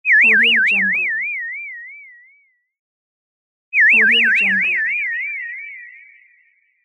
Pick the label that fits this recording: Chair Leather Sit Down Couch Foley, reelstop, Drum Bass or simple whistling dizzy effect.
simple whistling dizzy effect